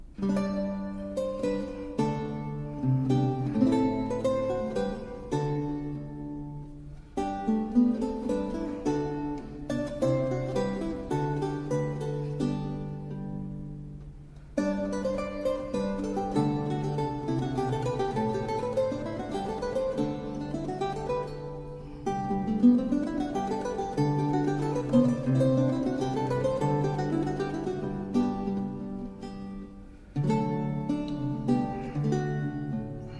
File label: renaissance lute; john dowland